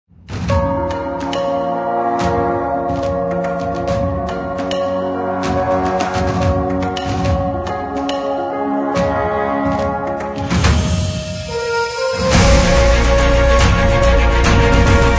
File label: Inspiring Trailer